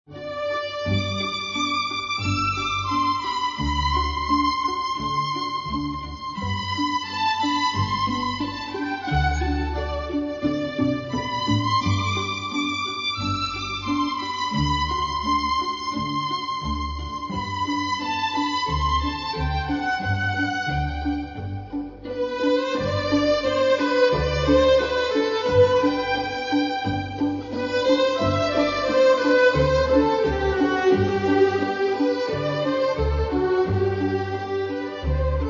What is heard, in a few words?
music relaxation